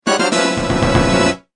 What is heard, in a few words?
Oh No! Fanfare; Zelda